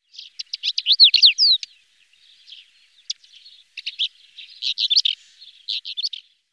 Nonnensteinschmaetzer Oenanthe pleschanka

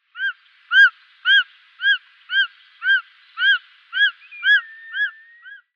Schlangenadler Circaetus gallicus; Vogelstimmen

